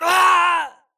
grunt death quick; Titanfall 2

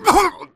body slam diag grunt; Titanfall 2

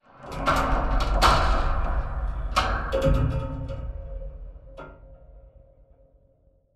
metal stress; Titanfall 2

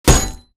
bullet heavyballistic titan; Titanfall 2

